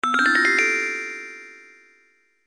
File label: win; stick jump